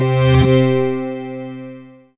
BKSPCGTR

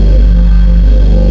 tractor beam loop; Earth and Beyond; EA Games

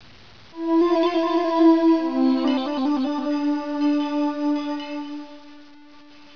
trill

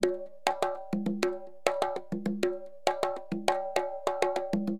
CONGA, Perc; LiveAct Pool; AcidJazz Funk

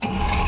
wheel start; WarZone 2100

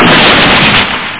SAMPLE EXPLOSION; 8,007 sample rate; Amiga 8-bit Sampled Voice

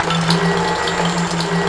WET

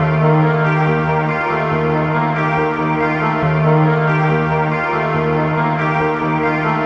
Guit pad; Techno; Melody; TechnoMusicMaker

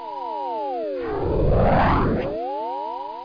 TELEPORT

